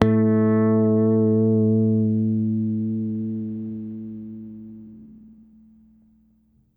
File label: OCTIVER A2, bass; Sampleheads; Ultra Freakin' Bass